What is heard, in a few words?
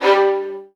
VN FX, Virtuoso Strings